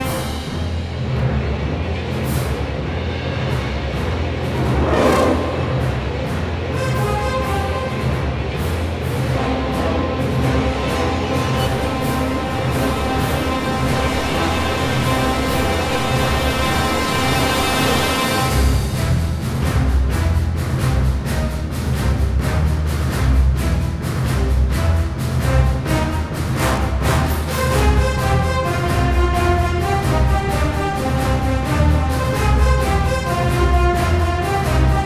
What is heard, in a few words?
Storm Loop; Jotun